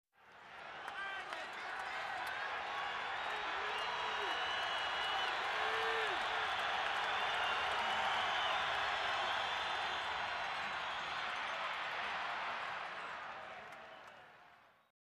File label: Football Crowd Exci, sports arena football